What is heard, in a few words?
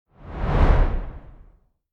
swoosh